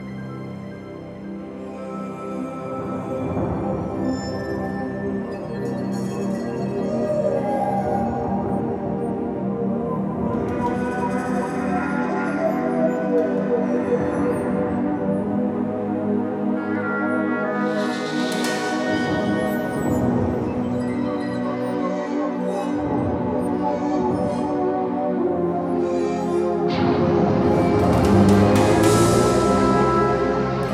Ethereal II instrumental

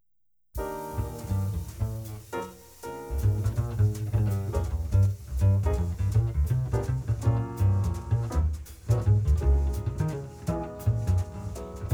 Waltz Medium

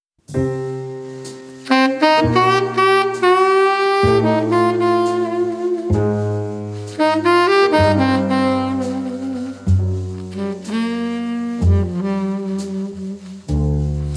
Four Tenor Saxes, Modern Jazz

